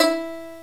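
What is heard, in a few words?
banjo single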